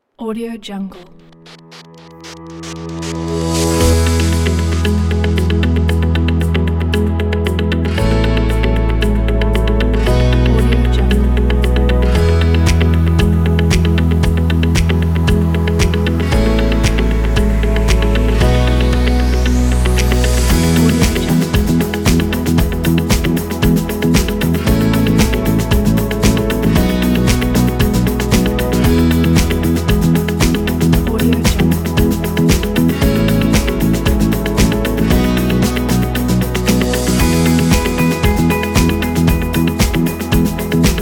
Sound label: business